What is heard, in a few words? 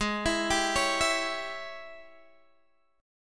Ab Major 7th Suspended 4th Sharp 5th Ab, Guitar